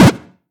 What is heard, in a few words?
Hit Minion; Pac-Man Championship Edition 2 Plus